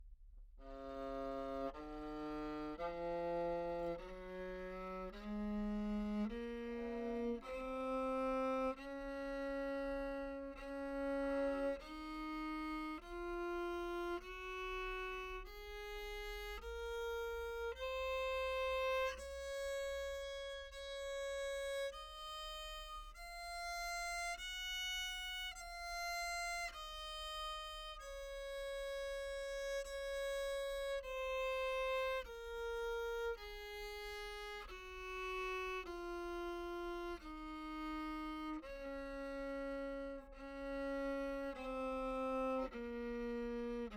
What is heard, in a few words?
treble viol, Anechoic